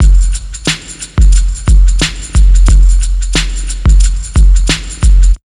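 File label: drums, Pure Electro; USB Soundscan vol.03; AKAI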